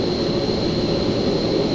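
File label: cockpit engine idle air; PC Gamer IT CD 47; USAF; USAFDEMO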